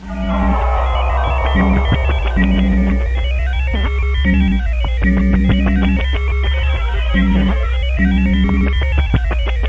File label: computer, Computery or Science Fiction